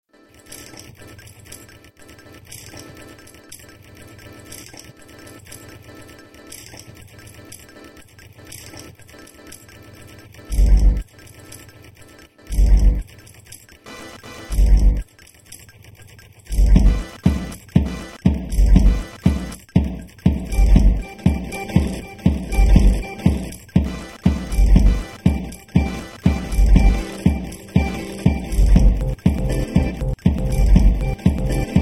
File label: mechanical accordion